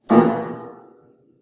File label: piano